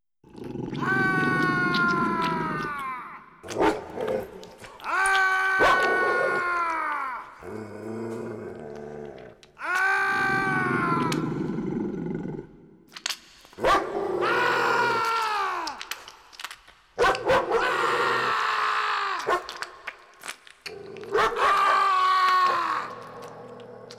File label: Man Eaten by Dog; 1001 Sound Effects; Halloween Horror Sounds Effects